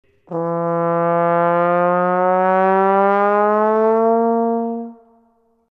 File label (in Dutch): glissando trombone